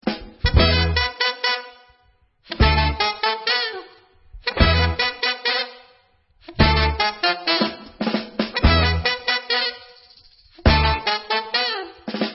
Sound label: Nigun Sameach